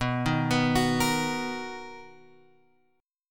B Major 7th, B, down, Guitar Open G

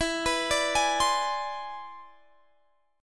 E 7th Flat 13th; E7b13; arpeggiated

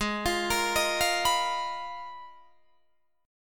Ab Minor 6th Add 9th, Guitar, DADGAD; 18,20,20,20,20,21; down

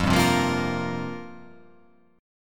F Suspended 2nd Suspended 4th F down

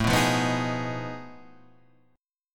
F 7th Sharp 9th Flat 5th A

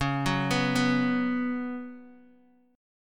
Db 7th Suspended 4th, Guitar, Open D

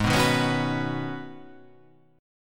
G sharp Minor 11th G sharp, chord

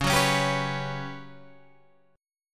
G Major 7th Flat 5th Db

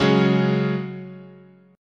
C sharp Suspended 4th, chord

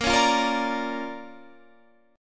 D Minor Major 7th Sharp 5th A sharp, guitar

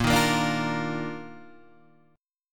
D sharp Major 7th Suspended 2nd A sharp

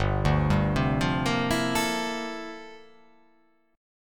G Minor 13th G down; Gm13 arpeggiated; JGuitar

